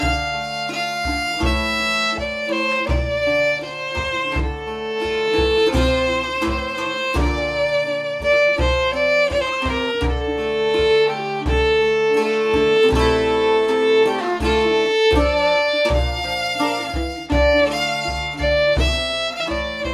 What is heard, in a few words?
Orchestra Ambience; Assassin's Creed III